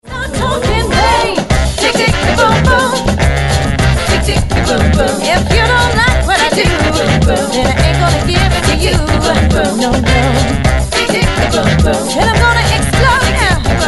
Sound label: Samba